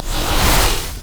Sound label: power transfer return; LoL; viktor